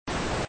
Jet Loops; space escape

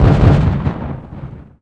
tank; PC Gamer IT CD 32

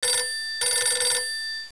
Telephone; DR. BRAIN

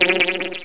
TWANG; worms dc; tykes

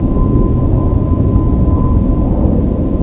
JET INT

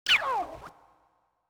scratch; LiveAct Pool

